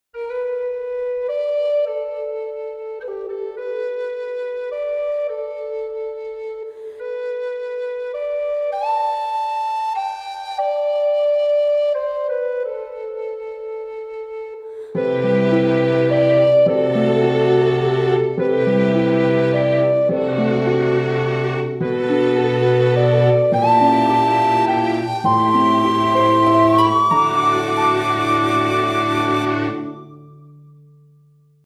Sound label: Ocarina of Time